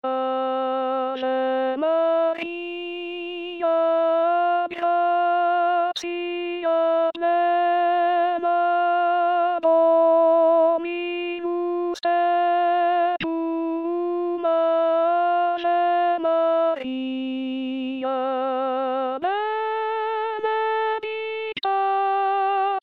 Ave Maria Alto, Chorale

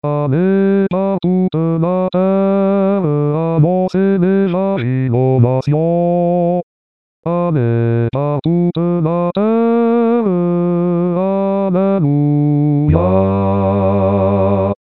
Allez Par Toute La Terre Basse, Chorale; Pentecote

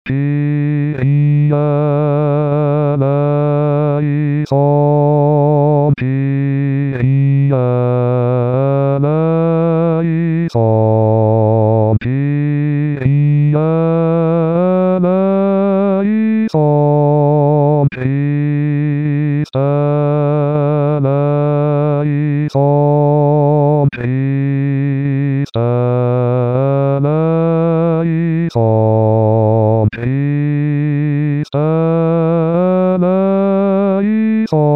Kyrie, Chorale

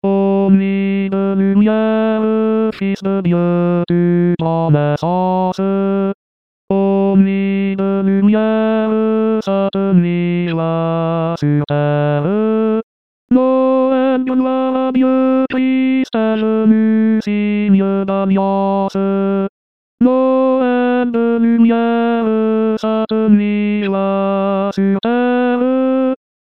Tenor, Chorale; Noel

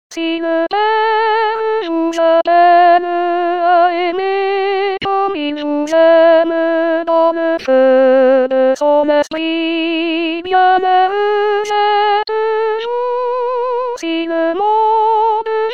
Si le Pere vous appelle Soprano, Chorale

